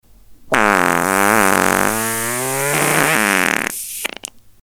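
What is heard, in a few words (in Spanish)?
pedos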